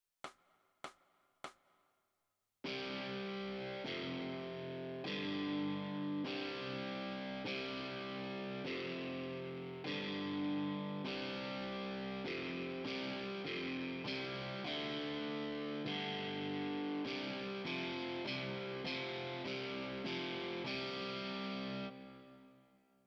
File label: OverDrive Guitar